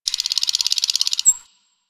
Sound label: Forest Bird Rattle; Warframe; Ambience; Grineer Forest